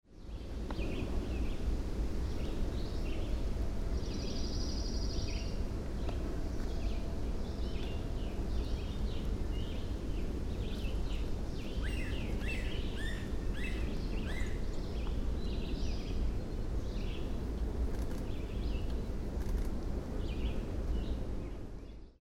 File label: Yellow-bellied Sapsucker nesting; Garrett MD